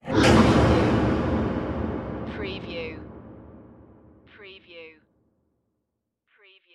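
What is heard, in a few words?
Production Element Hit Dark; Sound Effects Bible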